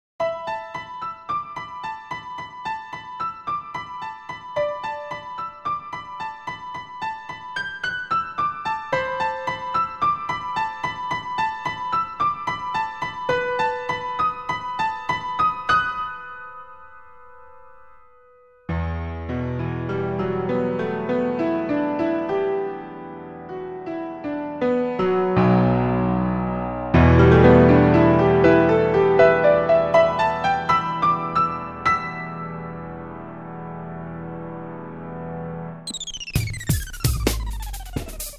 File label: piano